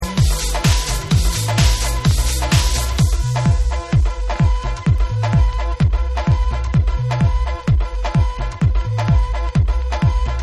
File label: 80s Retro Mix